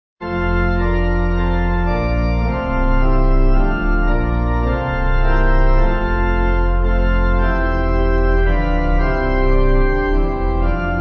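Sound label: Pipe, Pipe Organ backing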